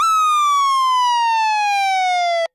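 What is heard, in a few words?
Federal Signal PA300 Wail, Siren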